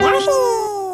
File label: Piano Loop; yoshi